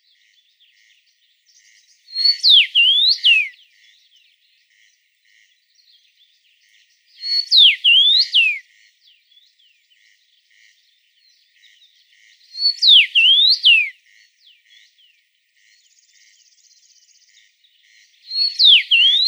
svist chechevicy

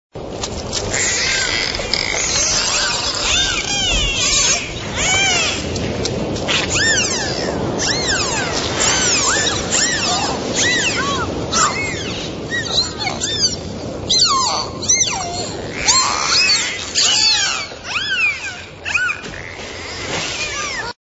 Zvuki prirody Krik del finov

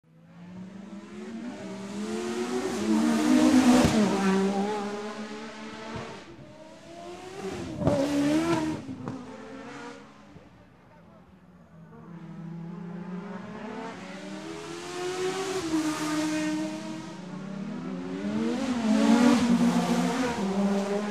zvuk gonok formula 1

